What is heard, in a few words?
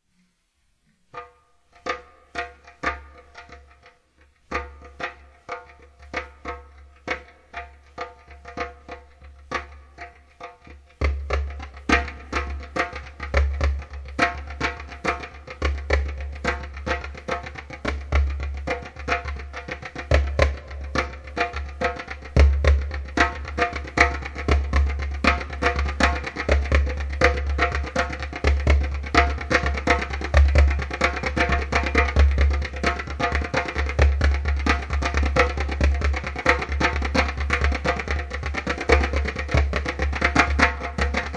djembe